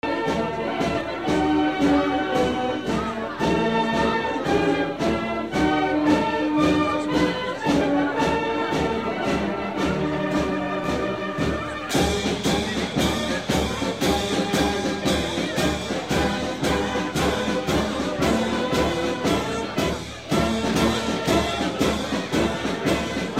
holidays town square band

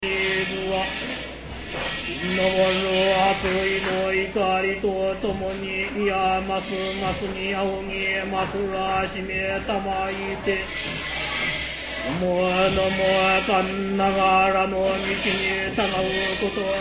asia shrine chant

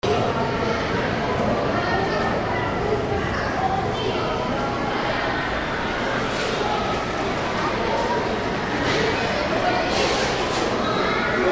atmo holidays shopping mall

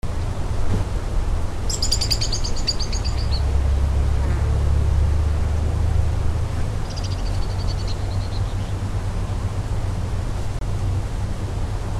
atmo arizona grand canyon pipe creek vista

